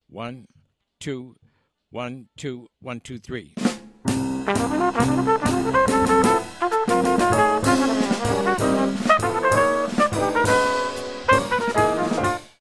FAST minus bass

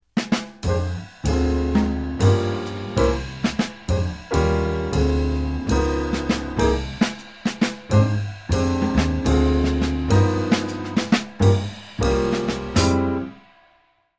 medium slow minus Melody

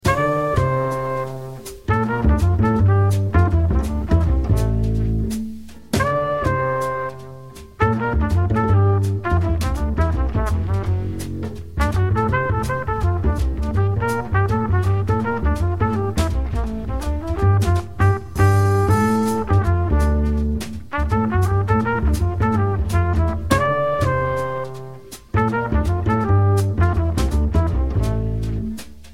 minus piano

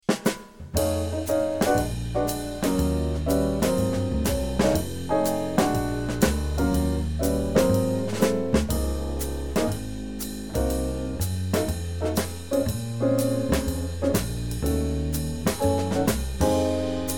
fast minus melody

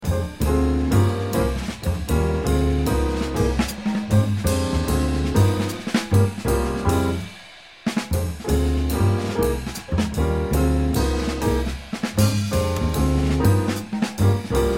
medium up minus Melody

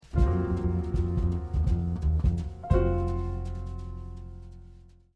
rhythm

